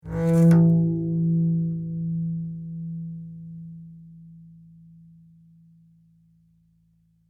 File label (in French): Harmo arco